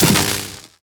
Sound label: portable coil attack